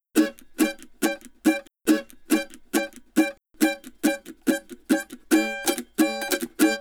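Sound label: Country Mandolin Chorus; Digidesign; Pro Tools Essential Loops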